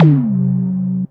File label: DRONE ZAP; Back In Time Records; Big Bang